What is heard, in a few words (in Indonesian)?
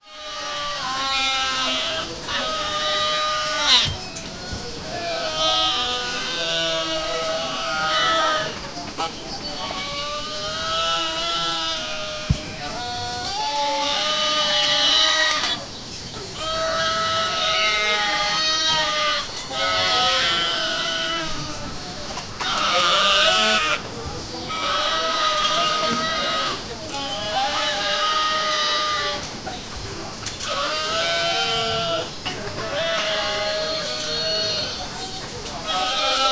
suara 50 kambing sedang, bleating